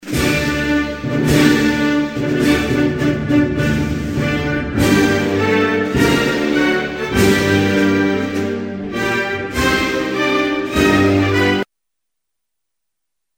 Grece, Hymnes nationaux

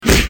Coup de poing

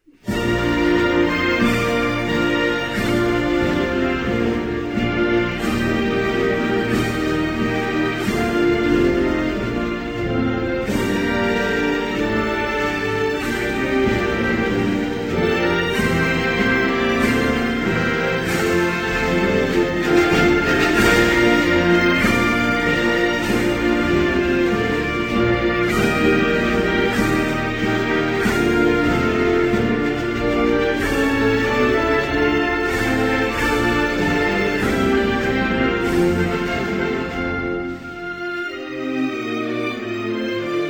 Irlande, Hymnes nationaux

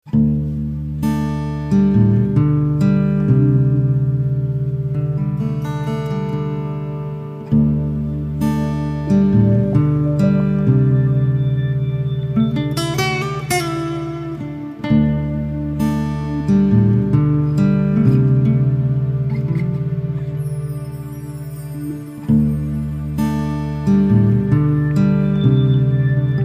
prairie de nuit, Textures et nappes

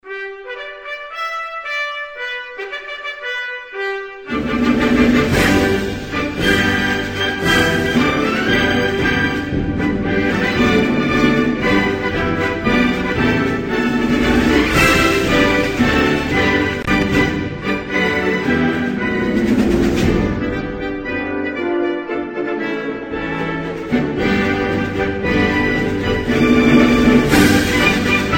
Chine, Hymnes nationaux

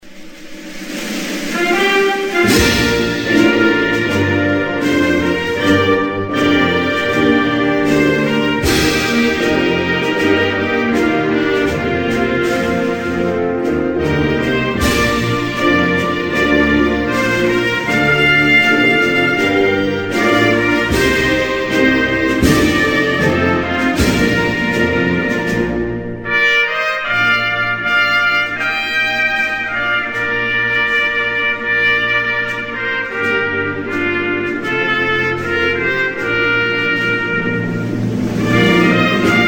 Viet nam, Hymnes nationaux

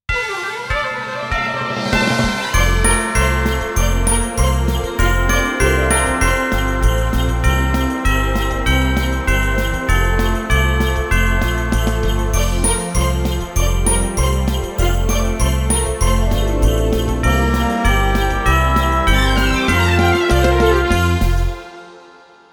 Noel jingle; Jingles medley

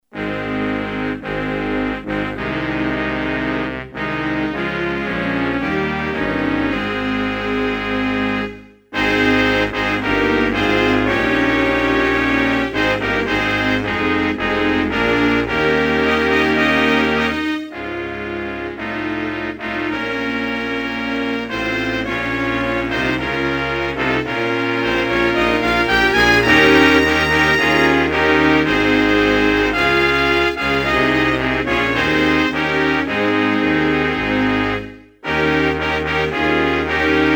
Somalie, Hymnes nationaux

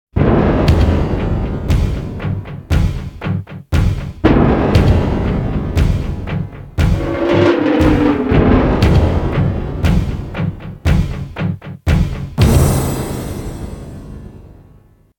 Etrange jingle; Jingles medley

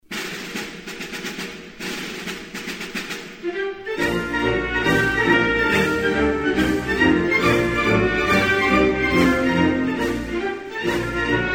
Algerie, Hymnes nationaux

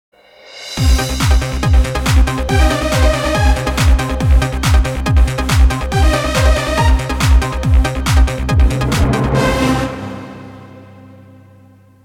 Emission link, jingle

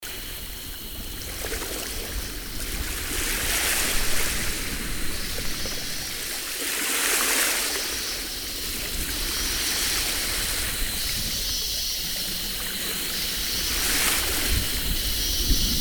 Brise d ete de bord de mer

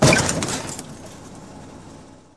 Coups de pare chocs; Accidents voitrues

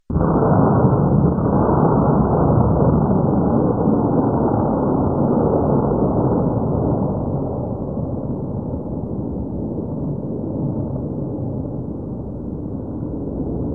Bombe atomique, Explosions

